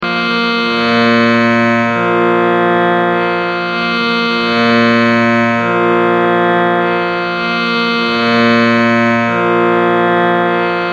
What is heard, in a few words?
Sruthi A-Sharp